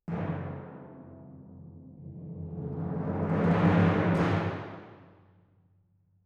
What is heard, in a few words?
Timp